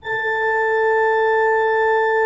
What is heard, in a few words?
A3, Cathedral Organ; ILIO; Propeller Islands